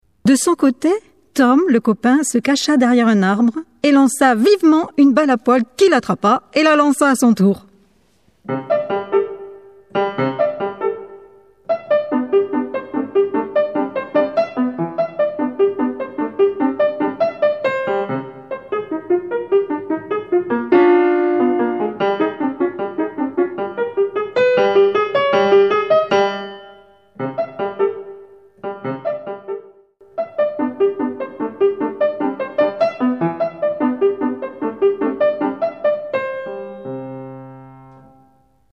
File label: piano; pieces enfantines pour le piano; Ping pong